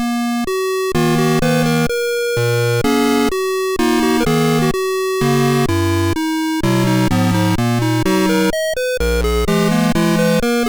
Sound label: Valse, MOS6581R3